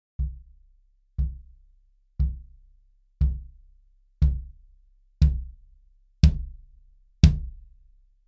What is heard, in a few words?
Bass Drum 22 - Felt Beater